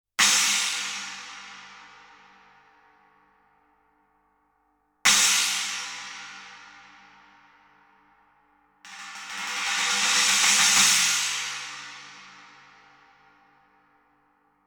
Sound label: Cymb China; 120 Degrees From Vertical